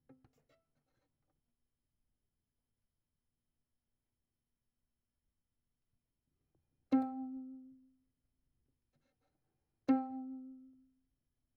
Violin; Verdigris Music

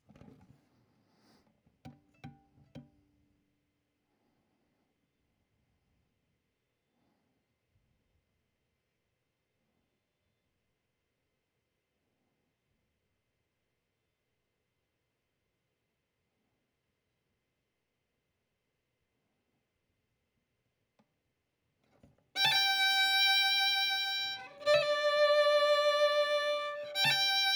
Violin; Verdigris Music

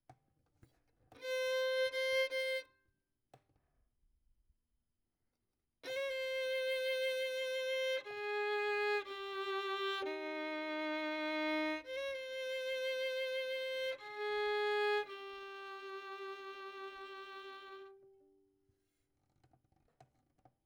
Violin; Verdigris Music

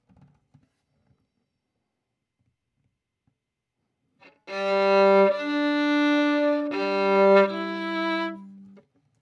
Violin

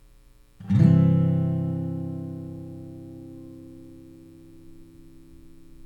Instrumental SImple; Copper Camp Audio Book

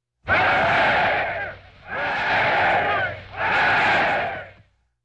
Man Cheering

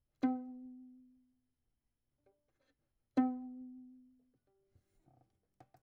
Violin; Verdigris Music

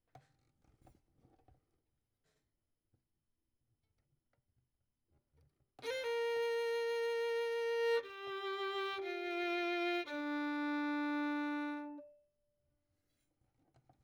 Violin; Verdigris Music

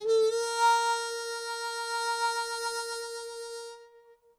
harp B2 Bb F; interstitial

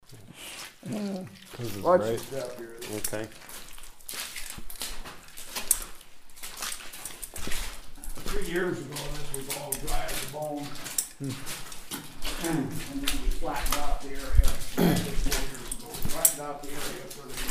muck sounds

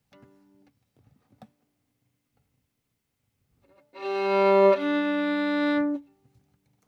Violin

